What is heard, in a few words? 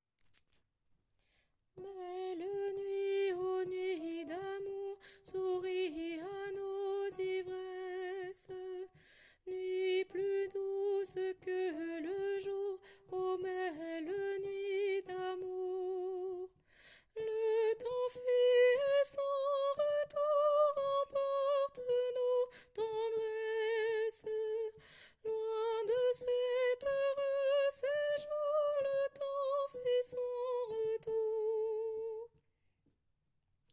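Barcarolle, Ténor; Mesures 1 à 28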